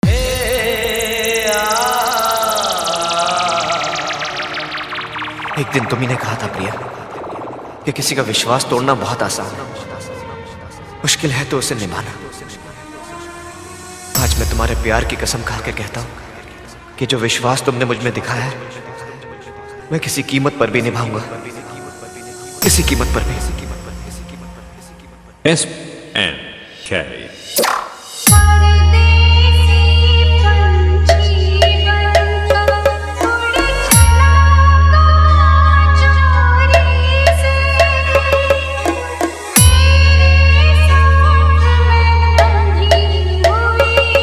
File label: Hindi Dj Remix Song Hard Faadu Electro Bass Mixx